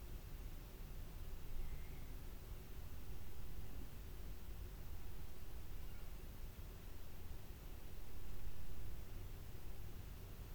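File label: hydrophone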